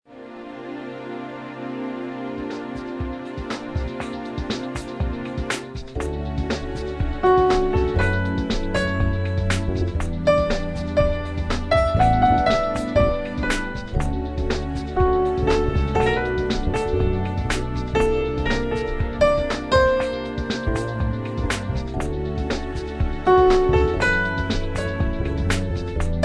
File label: light jazz